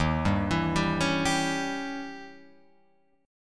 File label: Eb 7th Flat 9th, Guitar, Open F; 3,2,4,2,4,2; Eb7b9 arpeggiated; JGuitar